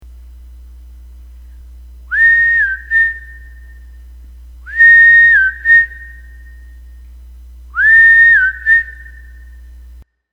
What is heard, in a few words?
Whistle